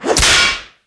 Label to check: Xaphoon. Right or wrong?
Wrong